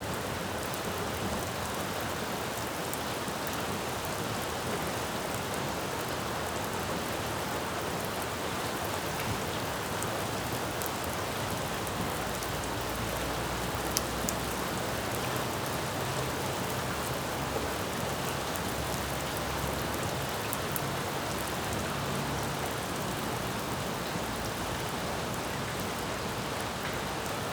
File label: Rain, Street, City, Splatty